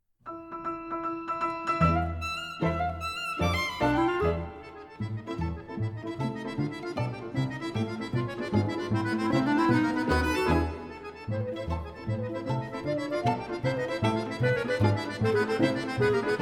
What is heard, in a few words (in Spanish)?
danza carolina